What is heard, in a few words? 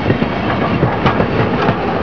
train; hl2